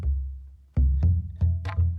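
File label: Calm Far, music; From Dust; PS3; Xbox 360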